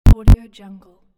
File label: access denied earcon